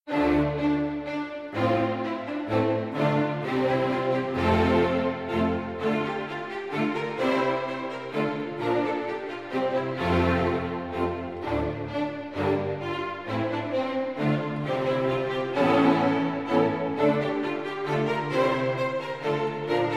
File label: Basse-Danse, string orchestra; Warlock; Capriol Suite for string orchestra